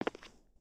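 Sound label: Foot Concrete Sneaker Walk, footsteps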